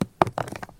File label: roll